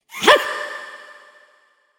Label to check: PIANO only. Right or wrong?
Wrong